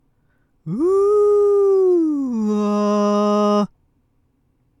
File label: glissando mix